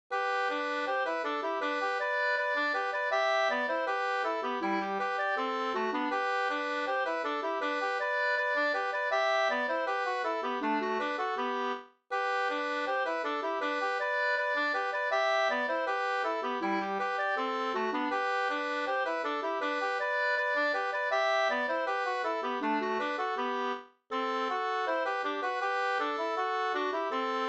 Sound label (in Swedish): schottis; GM-schottis e Anders Eriksson An 2st; Anders Eriksson, Härnösand, Ångermanland; med andrastämma